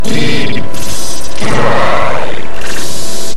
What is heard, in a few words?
deep strikes slow